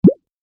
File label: bubble low